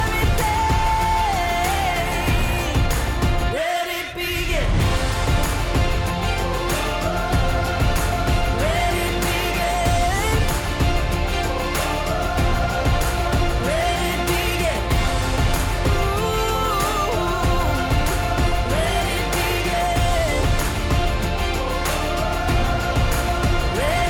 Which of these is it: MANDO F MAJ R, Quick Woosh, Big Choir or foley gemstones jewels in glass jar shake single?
Big Choir